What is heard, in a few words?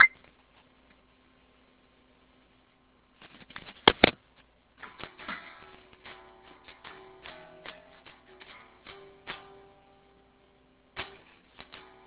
Guitar Slower Sad Song break